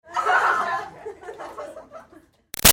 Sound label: Crowd Teens Girls Party Laugh Giggles; Crowds (Sound Effects:Backgrounds); Big Room Sound